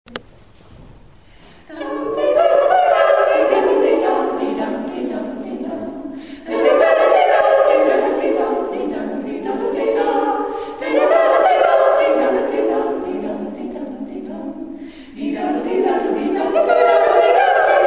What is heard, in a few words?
Deerness jig